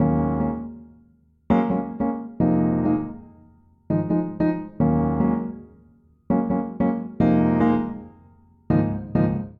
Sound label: Progression Lucan Shuffle iv-v-VII-iv-v-III Bb Minor 100 bpm, Upright Piano; MIDI Clips; Ableton; Factory Packs; Spitfire Audio